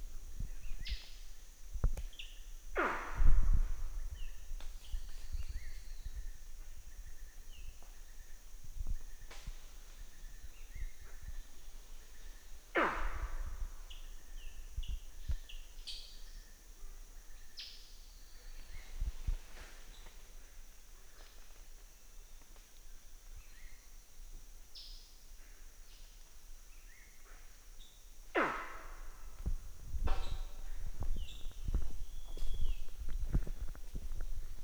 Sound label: Blue Monkey pyow; vocalisations; compcog